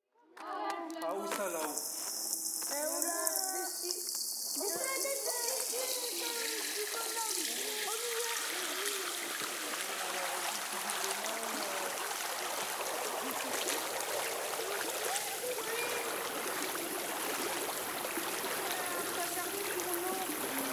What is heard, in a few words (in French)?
gargouilles imitations